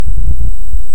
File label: Hydrophone; OneOcean